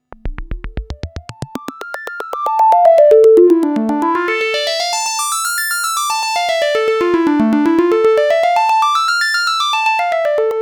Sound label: jp-8 arpeg; Jupiter-8; arturia origin; sans aucun effet; arpèges